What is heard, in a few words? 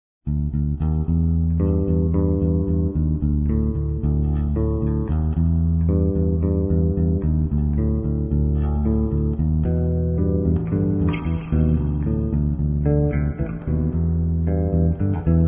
Guitar Electric Bass; Zomboid; Lifestyle